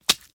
Chopping Off Fingers, Quick Juicy Chops.

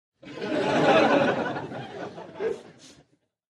Crowd Short Laughter, medium

